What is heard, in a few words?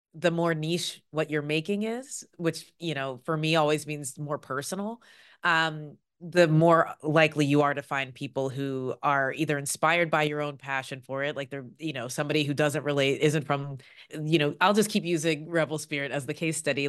audience